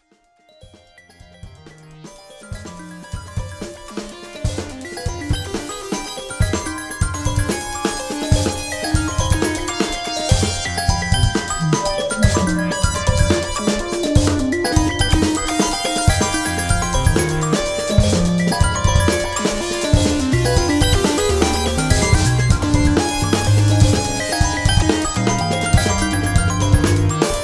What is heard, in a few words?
Live Segment with Drums